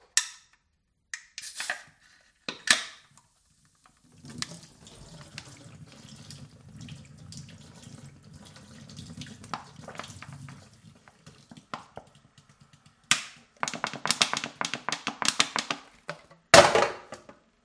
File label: Can Fizzy Drink Opened Poured Thrown down